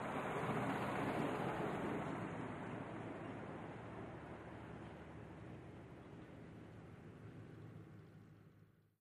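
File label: Buick, Short By Medium Speed, close up, antiques; 1938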